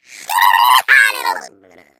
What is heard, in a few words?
jump spin, taunts; player